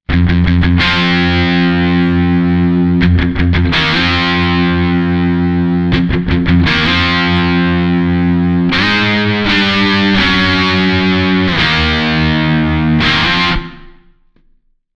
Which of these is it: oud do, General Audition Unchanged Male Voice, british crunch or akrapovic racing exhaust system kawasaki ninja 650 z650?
british crunch